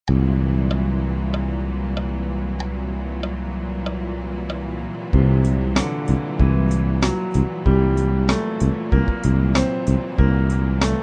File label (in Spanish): lidia b7